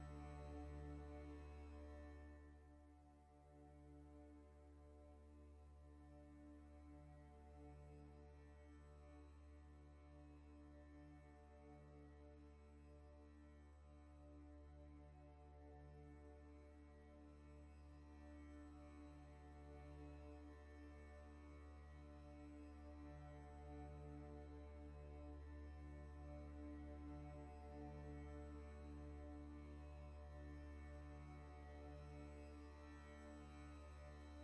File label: score Graveyard; Journey